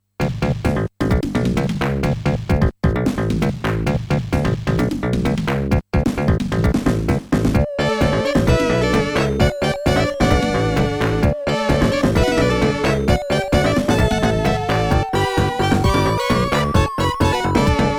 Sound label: bonus stage fruit machine; sonic 16bit